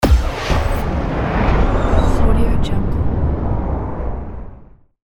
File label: heavy trailer impact; floraphonic